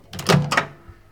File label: Door Microwave